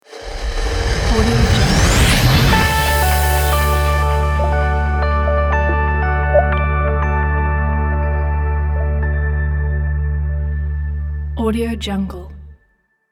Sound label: the industrial logo